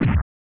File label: Hit